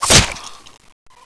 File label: knife hit; weapons